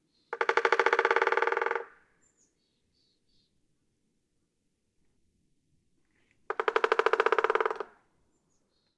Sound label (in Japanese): ooakagera